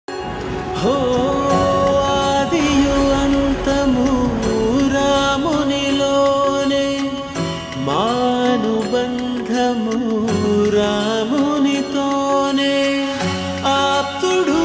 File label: telugu ringtone song; adipurush; kriti